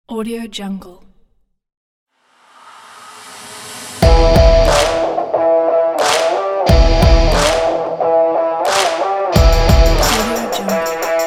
powerful drive rock

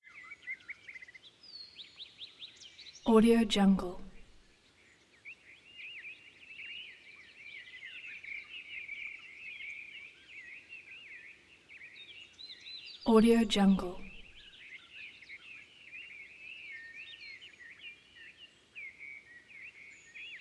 forest birds constant light air tone

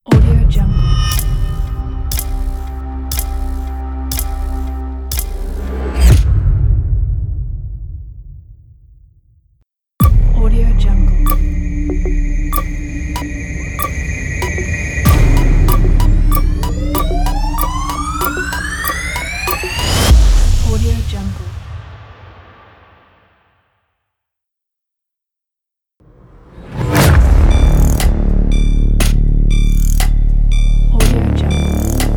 countdown logo pack; wavemusicstudio

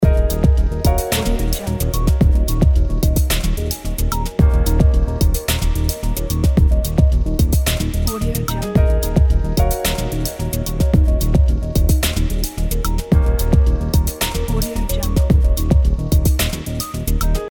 tech background loop

